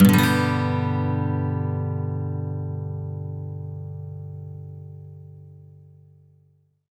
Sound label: MAJ SWEEP G, Electric & Acoustic Guitar Loops; USB Soundscan vol.04; AKAI